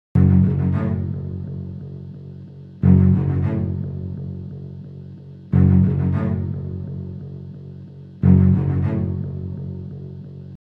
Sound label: suspense